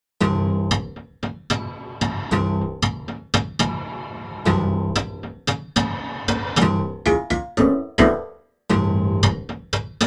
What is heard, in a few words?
Piano Prepare